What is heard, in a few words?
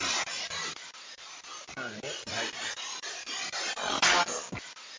2nd Floor Landing; brookline private residence investigation